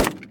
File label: m4 tail; Weapons; FMOD Project